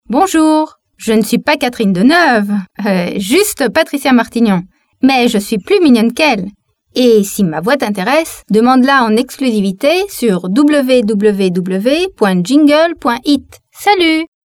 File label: jingle